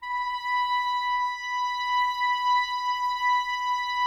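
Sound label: clarinet; Miroslav Vitous; Woodwind Brass Ensemble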